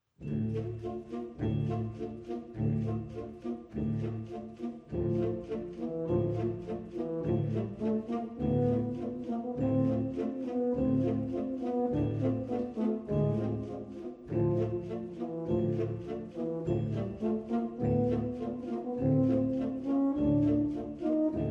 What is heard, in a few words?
Grazioso, fanfare